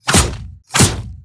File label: Climbing; Evolve; Wraith